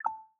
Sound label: navigation cancel; Signal Desktop